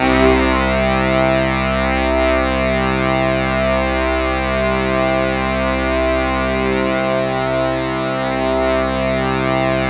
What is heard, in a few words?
C2, Synth Line; 90 s Sample CDs; NiceBeats; SynthLine VOL-26